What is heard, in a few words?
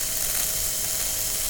ice loop